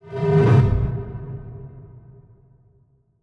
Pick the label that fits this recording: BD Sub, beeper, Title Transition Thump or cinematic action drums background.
Title Transition Thump